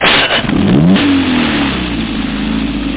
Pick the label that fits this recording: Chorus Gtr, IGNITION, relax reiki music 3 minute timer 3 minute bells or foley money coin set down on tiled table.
IGNITION